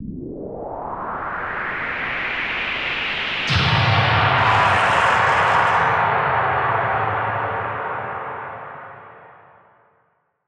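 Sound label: assault missile; cardboard kaiju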